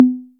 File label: Ana Hi, Electric Tom, Yamaha DTXpress